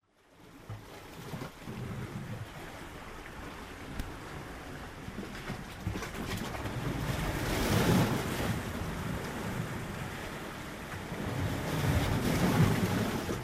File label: Haeundai rock